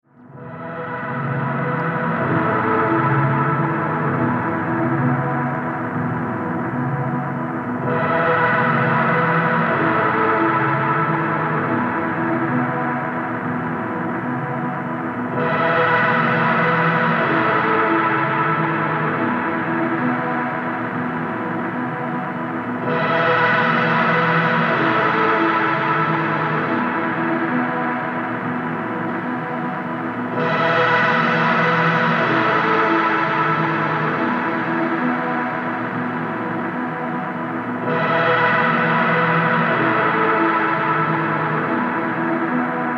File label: Broken Cymbal